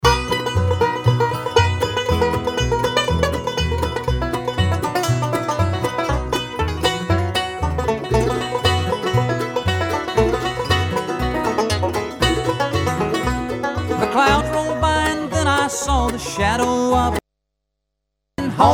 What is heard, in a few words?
banjo